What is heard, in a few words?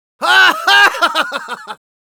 laugh happy, es; Risa feliz; Demoman